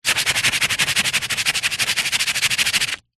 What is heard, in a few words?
industrial sandpaper rub smooth wood surface